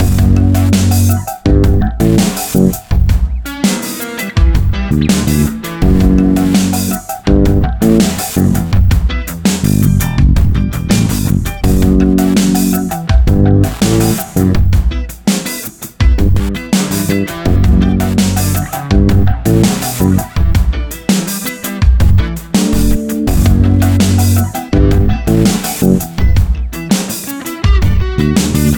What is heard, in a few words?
Tense; Everspace 2